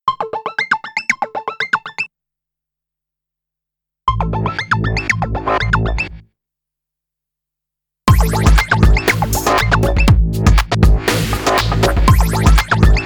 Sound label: POP TRONIC; Nokia; Henry Daw